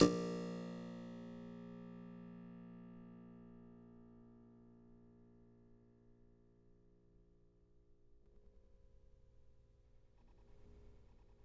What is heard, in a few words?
harpsichord